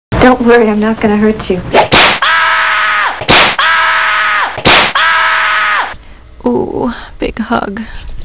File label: whip hurt